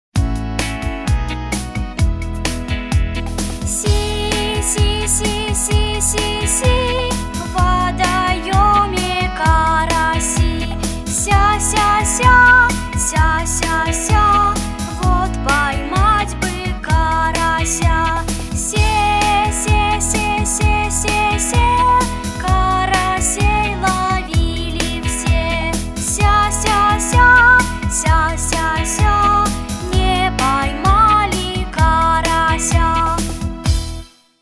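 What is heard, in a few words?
speech therapy songs; pesnya dlya detei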